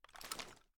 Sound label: inspect; gmod; viper; weapons